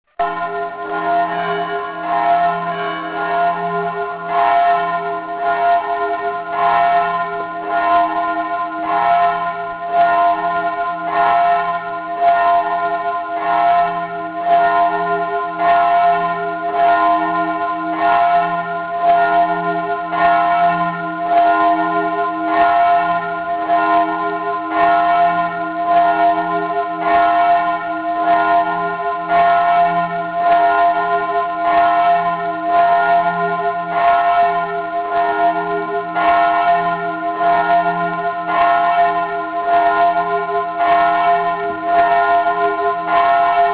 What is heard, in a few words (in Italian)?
campane messa domenica